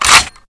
m1887 restart, weapons

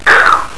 pain

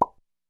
chpok

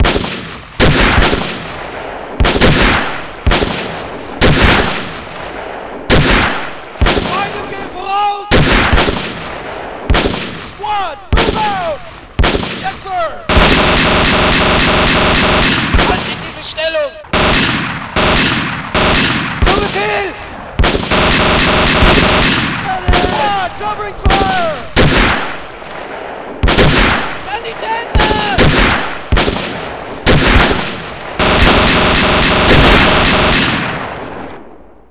fight; Wolfenstein

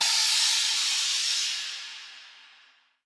steam, ambience

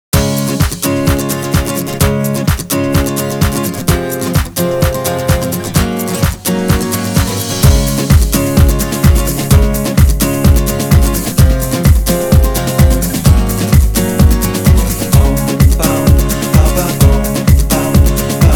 music

